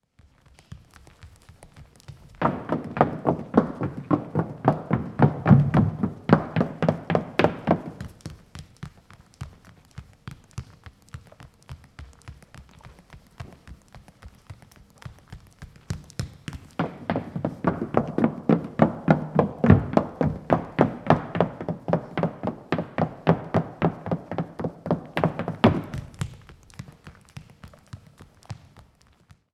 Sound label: Frisky Heels On Rubber And Wood